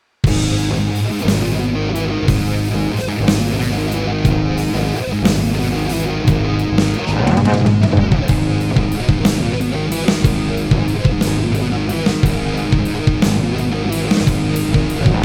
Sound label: metal